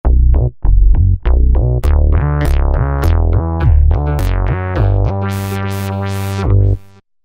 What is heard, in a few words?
Voco 'n Bass, Roland V-Synth; Factory Patches